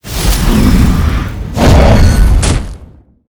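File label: guardian spawn, monster; sobek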